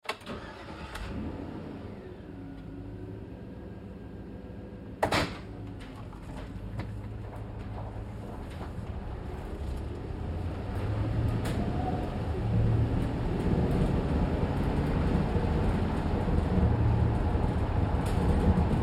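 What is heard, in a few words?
Truck GMC Savana Interior Drive Corners Slow Med; 2010 GMC Savana; Trucks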